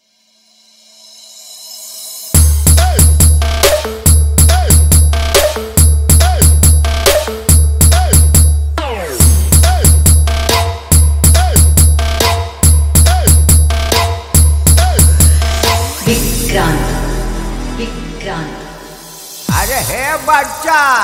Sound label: Beet Sound Check Vibration Dj